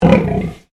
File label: Animal Pig Landrace Inside Grunt; Edgar; Edgar's Mission Farm Victoria; Pigs; Animals